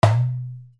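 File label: percussion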